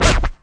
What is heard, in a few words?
scratch blast; Scratching and Turntablism; DRUM LOOPS and BREAKS